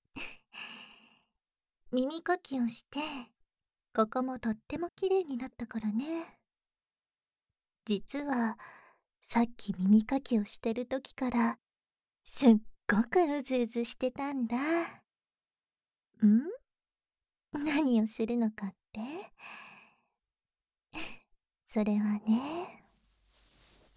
耳舐め; おねえさんとする、えっちなこと

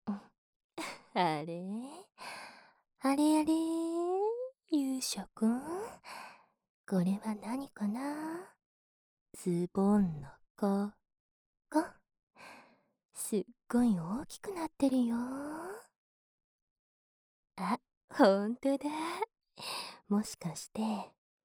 W耳舐め

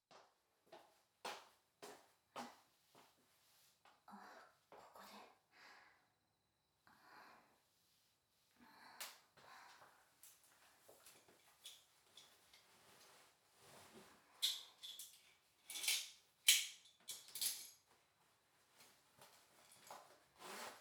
フェラ, ASMR; 玄関で早速フェラ

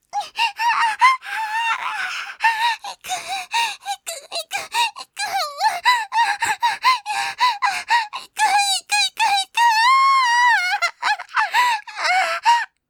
喘ぎ 絶頂寸前→とろ落ち喘ぎ; すすきちゃん（狐耳のJC現代系退魔師）

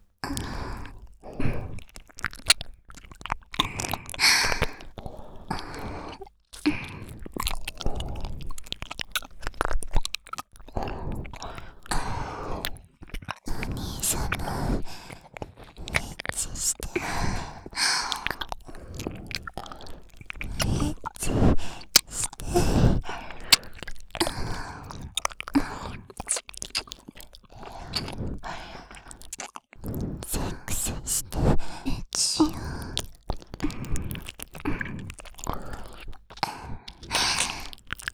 エッチしよ♪ 耳舐め; 静華

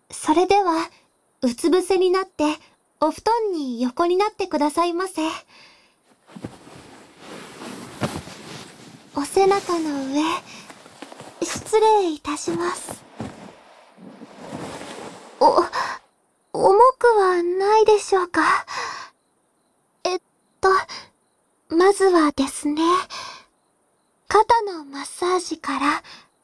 お布団で優しくマッサージ〜お背中&肩もみ〜; ねこぐらし。〜ミケ猫少女と鈴のオト〜; 上坂すみれ; ねこじゃらし

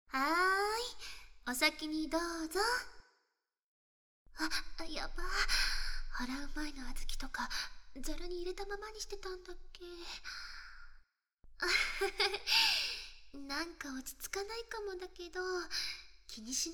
お風呂で垢すり; お風呂で垢すりして綺麗にしようね

